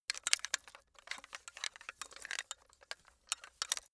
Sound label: metal pipe handling